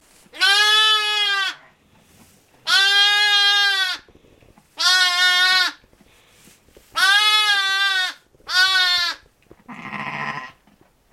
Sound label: Lamb wailing with ewe at end